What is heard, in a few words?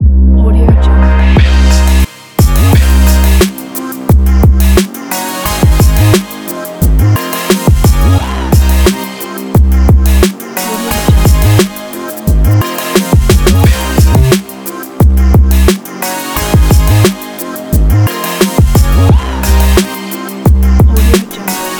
this is urban beat